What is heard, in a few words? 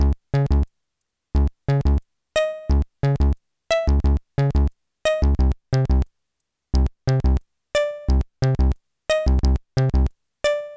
bass